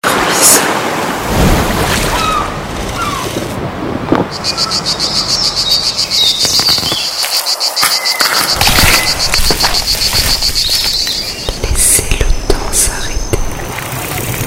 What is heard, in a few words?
home asmr